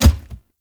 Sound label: Heavy Grunt Boot Walk Default, footsteps; Characters; Enemy; Sinecure